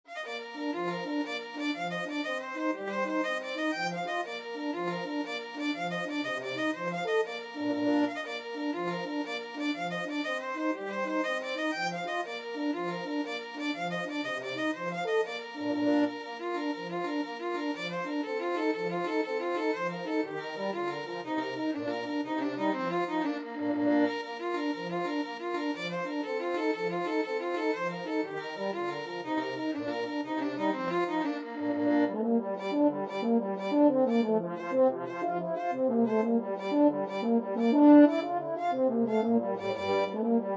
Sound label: irish jig medley, flexible mixed ensemble 3 players